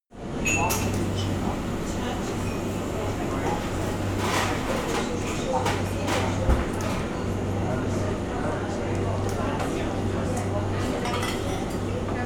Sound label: restaurant crowd wallah service coffee shop